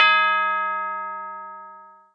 First Alarm Clock

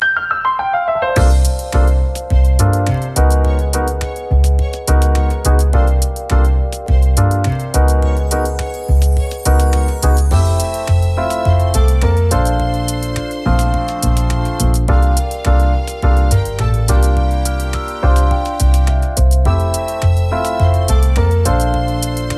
BGM BATTLE RESULT

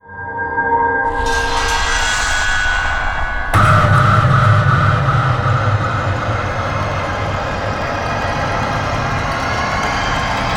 Outro; Zombie Island

